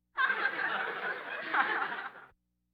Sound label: Laugh tracks